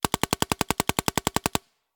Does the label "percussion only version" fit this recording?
No